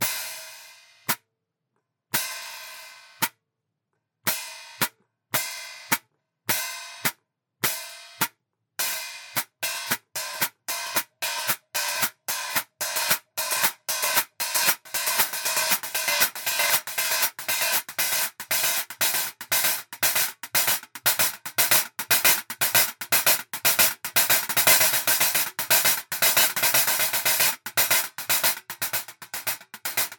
Paiste 12 PSTX DJs 45 Hats

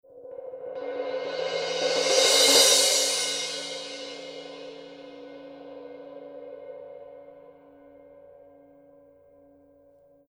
Color Sound 900 Black Heavy Crash Paiste

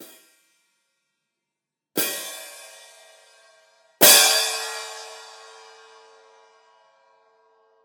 Paiste 14 PST3; Band

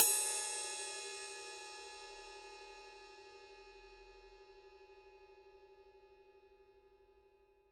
PST 5 Medium Ride Paiste; سنج راید پایست مدل pst5 سایز 20 اینچ

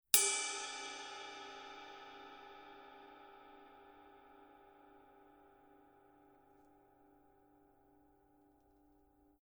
Color Sound 900 Black Mega Ride, Paiste

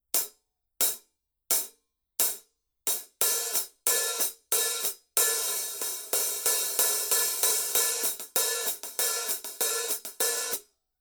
Color Sound 900 Black Sound Edge Hi Hat Paiste

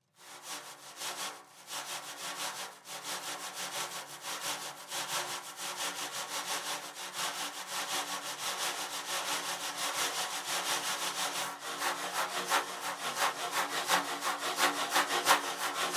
Shake

